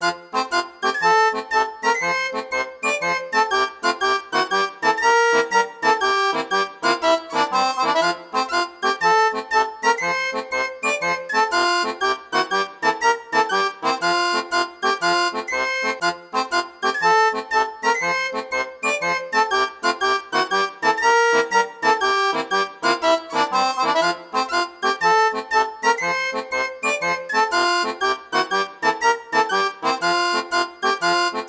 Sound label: Ditty; The Jackbox Party Pack 6; Jackbox Games